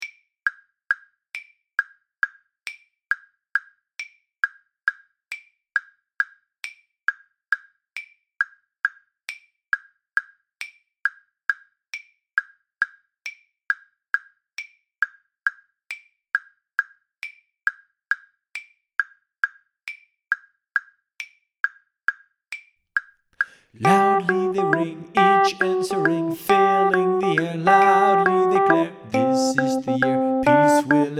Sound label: carol alt